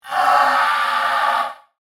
Robot Cyborg Vocal, Scifi, Robot, Cyborg, Vocalization, Science, Fiction; BlastWave